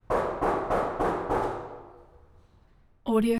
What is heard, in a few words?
door knock; prosoundfx